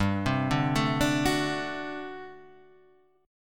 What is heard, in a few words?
G Major 7th Suspended 2nd Suspended 4th, chord; GM7sus2sus4 arpeggiated